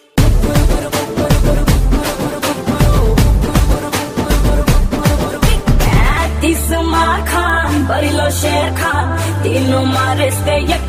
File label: Kick Ringtone